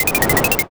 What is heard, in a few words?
skeleton